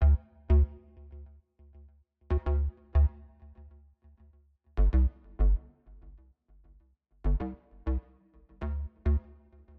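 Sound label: loops and synthesizers for bass guitar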